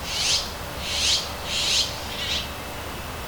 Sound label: Cyanopica fledglings